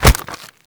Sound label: Infested Bone Blade Scatter Shot Proj Impact; Warframe